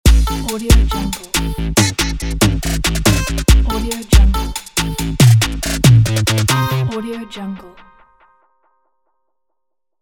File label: hot modern hiphop logo pack